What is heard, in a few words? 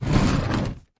Slide On Floor, trunks